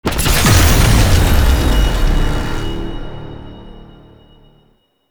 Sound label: Exterminate Escalation Started; Warframe; Nineteeen Ninety Nine